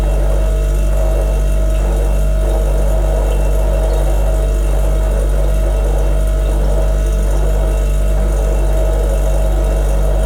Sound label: Electrical Fridge Hum, Water Drips, Rattle; MACHAppl; Jake Fielding; Fridge Hums